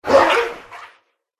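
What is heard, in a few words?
cerberus wound; tserber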